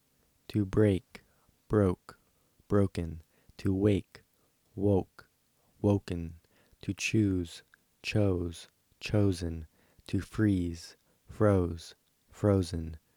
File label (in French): break